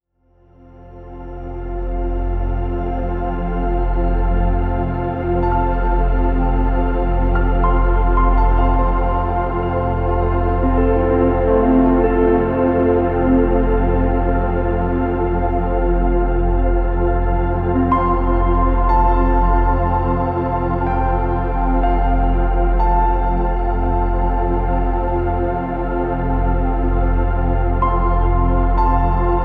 Sleep Sounds of Nature Soft Background Music - Soothing Ambience